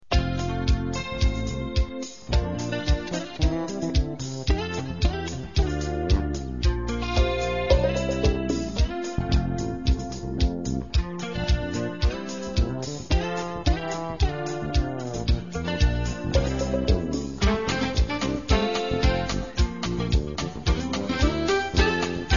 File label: Reggae Pop